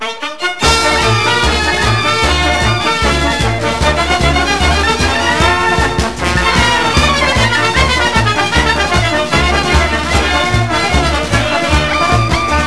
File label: bulgar